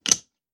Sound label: tile move; Casualino; Domino